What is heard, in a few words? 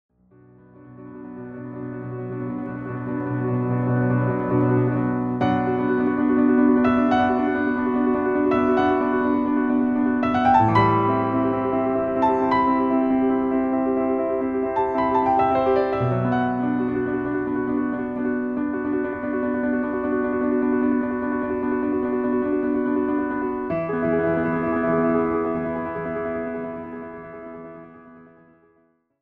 la flute enchantee, piano accompaniment